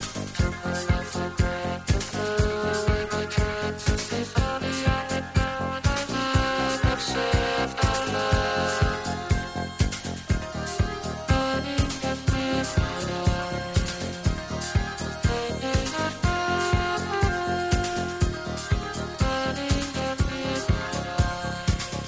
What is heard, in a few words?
vocoder